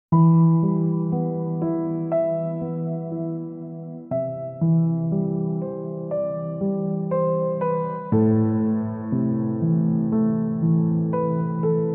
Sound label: Beautiful ocean piano